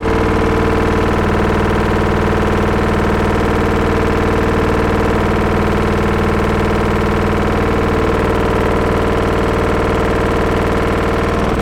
household washing machine full spin banging against side slow stop